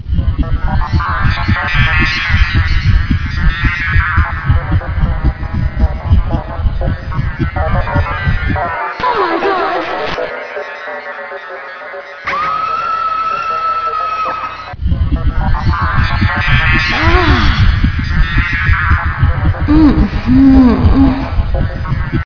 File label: alien probe